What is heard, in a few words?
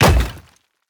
Hit Bullet Wood